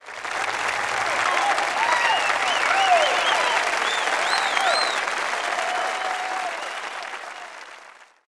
Crowd Applause; Fightbox; PS2, Windows

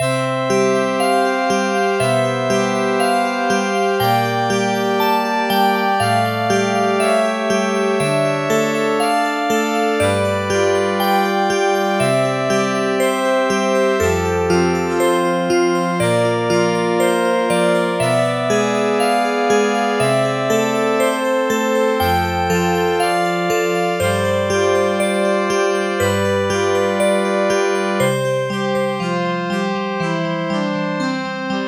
gameover; Etrian Odyssey I HD

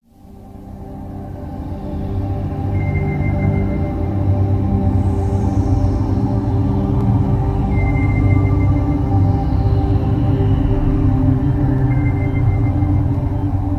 bgm; PlayStation Broadband Navigator; PS2; Koei GAMECITY

